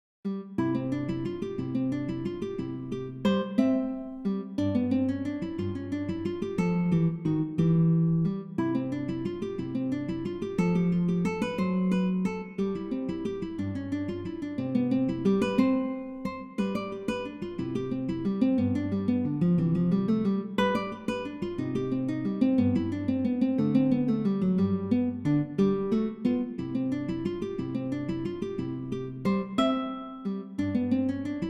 Bagatelles, Solo Guitar

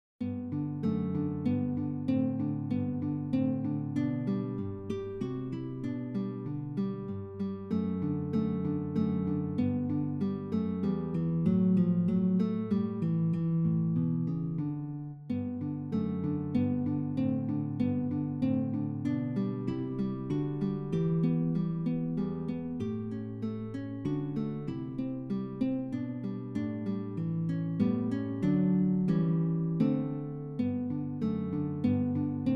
Bagatelles, Solo Guitar

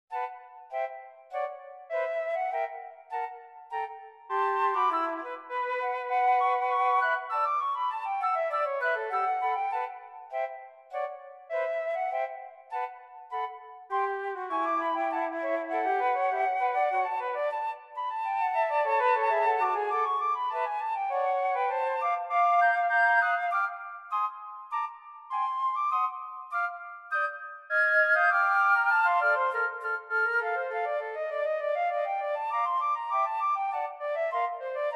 3 Flutes; March

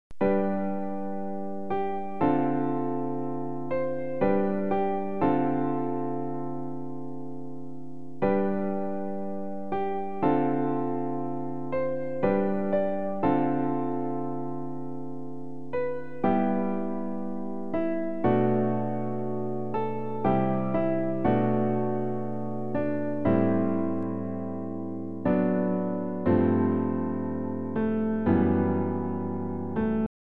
pensive mood; piano

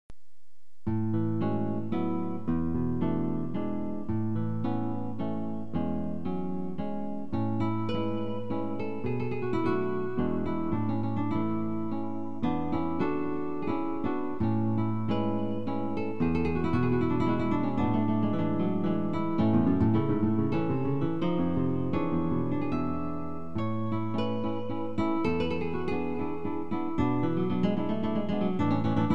suite guitar

